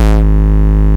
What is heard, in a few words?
Bass, Root G#; Vengeance Samples